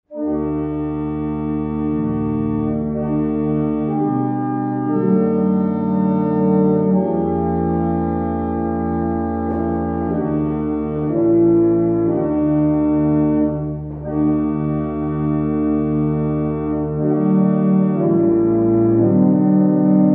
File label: Troytes Chant, barrel organs; Barnston